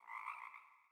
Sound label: sting frog; Rust